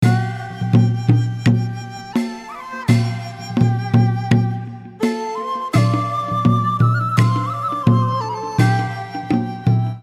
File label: free spin melody; games; Dragons Pearl